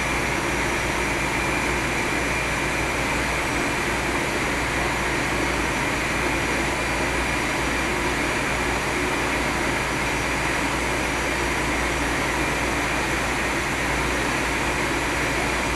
ac room; Titanfall 2

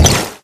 imp bullet lightballistic shieldwall; Titanfall 2

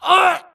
grunt death neck; Titanfall 2

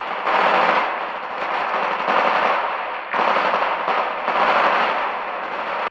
under fire north tower; Titanfall 2

